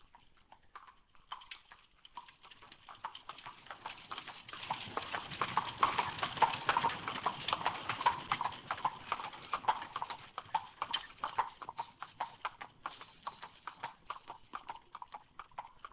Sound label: Horse Cobblestones; Ambient; Civ4